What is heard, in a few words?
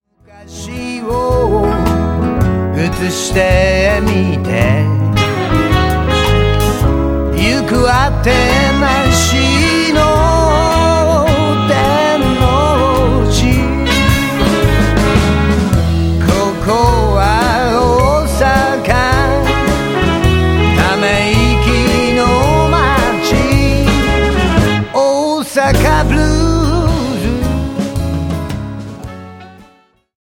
Blues